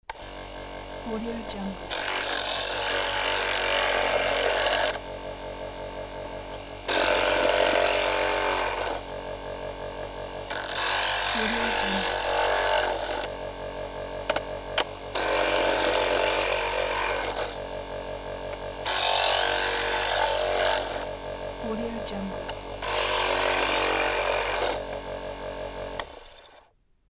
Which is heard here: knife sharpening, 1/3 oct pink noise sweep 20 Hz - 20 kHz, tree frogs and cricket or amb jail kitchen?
knife sharpening